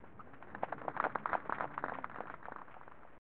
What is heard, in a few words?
Clapping; PGA European Tour; 1996 snes